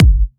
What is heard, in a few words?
Bassdrum; Vengeance Samples